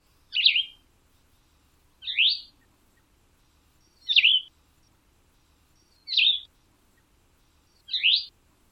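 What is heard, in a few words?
Cassin's Vireo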